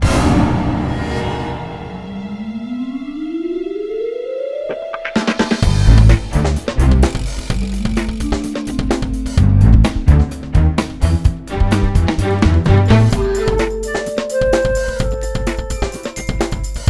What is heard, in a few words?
Funk Battle Loop with Transitions